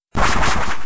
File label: effect fly; P3D-Legacy